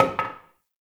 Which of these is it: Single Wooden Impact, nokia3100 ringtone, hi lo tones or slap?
Single Wooden Impact